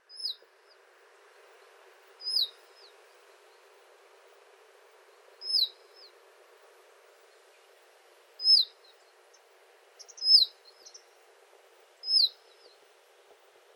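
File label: Russet Sparrow, obihiro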